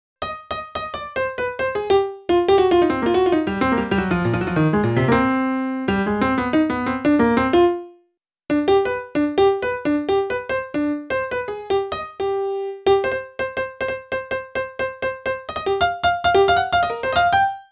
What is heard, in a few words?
Piano Solo, Cinematic Funk; Sony Creative Software; Urban Tone Poems